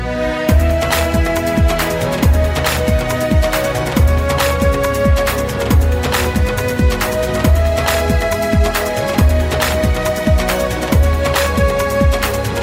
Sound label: maha mantra hare krishna hare rama